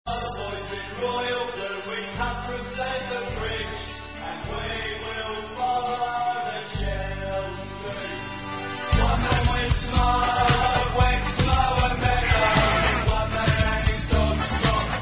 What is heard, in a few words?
Ten Men Went To Mow